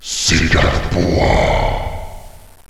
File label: planted